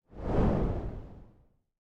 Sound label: dagger whoosh; smite; pele